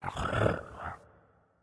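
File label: Movement Purr; smite; god bakasura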